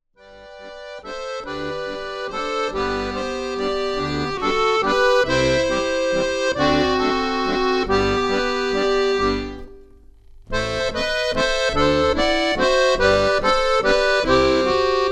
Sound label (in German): Akkordeon; auf Reisen